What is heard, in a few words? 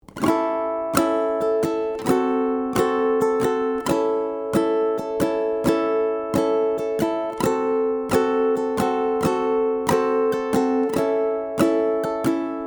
Strum Blues